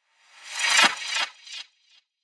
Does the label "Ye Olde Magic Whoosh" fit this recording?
Yes